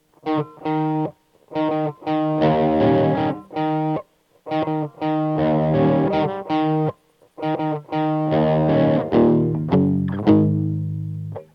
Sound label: rock